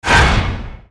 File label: Jump; Eme Golem